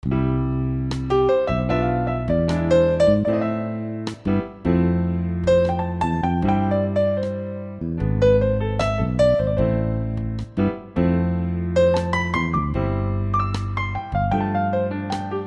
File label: Jazz Bass, Piano Steinway; Realistic Virtual Piano; Bassesland; Syntheway